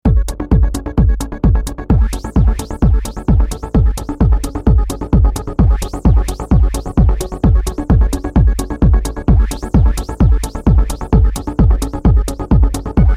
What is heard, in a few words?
Amb House; EMX